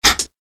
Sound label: notif